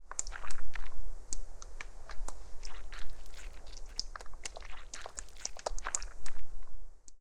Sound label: wax oil drop